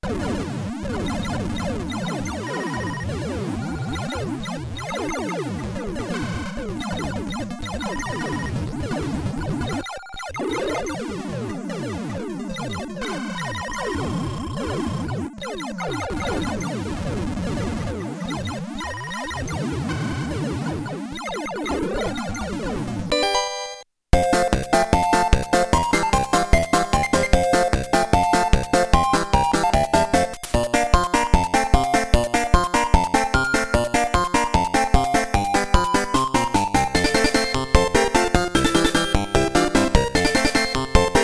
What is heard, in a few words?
percussion head, SCC+ Stereo; SD Snatcher OST